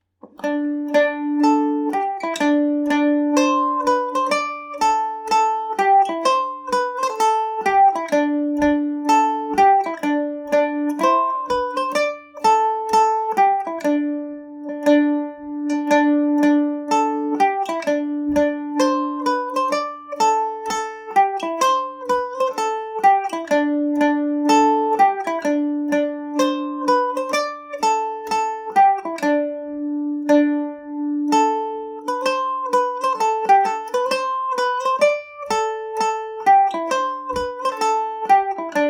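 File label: md304 D mixolydian, irish mandolin; song of the chanter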